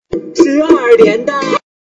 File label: sound water compare point